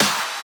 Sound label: clap